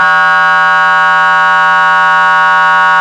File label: horn, sirens; federal signal pa 300